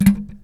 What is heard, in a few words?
Microphone Sound